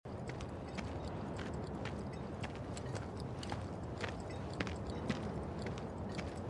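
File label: footsteps; final fantasy vii remake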